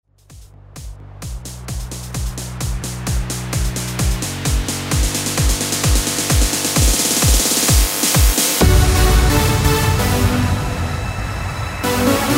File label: Epic Party Intro Edit 130Bpm